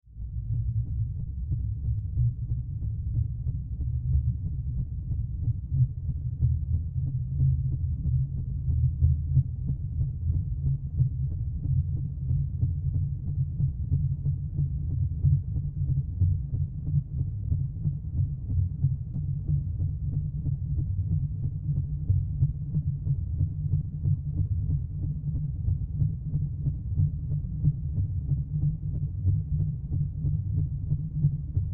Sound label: Motor Industrial Mechanical SciFi Pulsing Distant, Throbbing